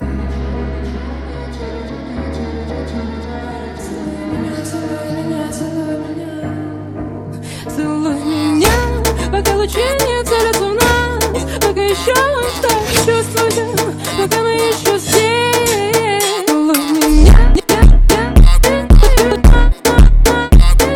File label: Phonk Ultra Slowed